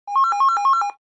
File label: Pager Ring Urgent